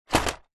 plastic hollow large fall over drop; foley

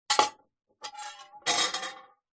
warfare sword small set down on hard surface

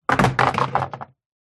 household chair patio plastic drop on ground

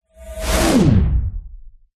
magic spell whoosh powerful energy pass electric

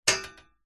impacts metal hollow frame hit clunk

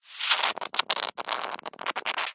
foley liquid suck foamy bubbles

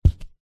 foley foam board set down on hard surface; zapsplat

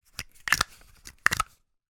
toy lego duplo bricks clipping together removing

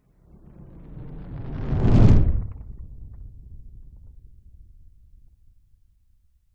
nature fireball pass by large designed

